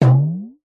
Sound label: cartoon impact hit drum ascending tail; zapsplat